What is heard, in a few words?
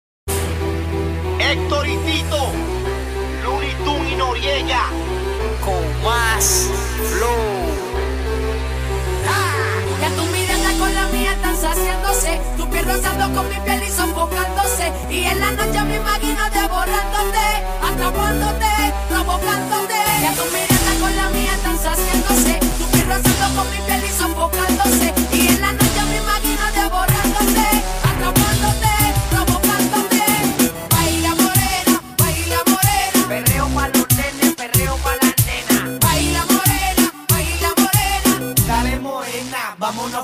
reggaeton mix; reggaeton mix evolution